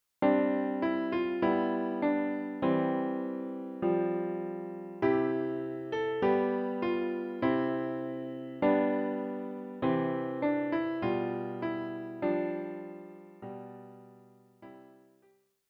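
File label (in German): Klavier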